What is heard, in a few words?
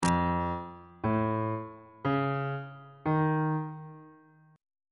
f augmented 7th chord intervals on bass clef